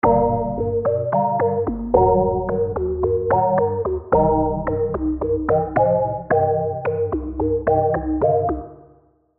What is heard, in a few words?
Receive, keys; revealed diva keys vol 2